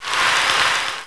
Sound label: flare; STAR TREK